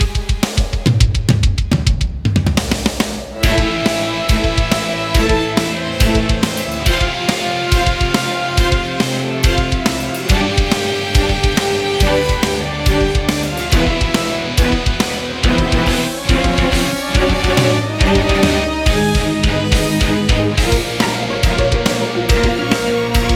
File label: TENSION